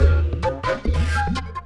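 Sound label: Music Dpad 145 Maxisphere; From Dust; ps3; xbox 360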